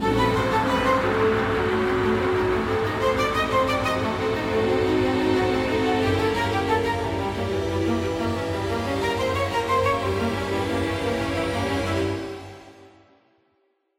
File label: Worried; tintin reporter cigars of the pharaoh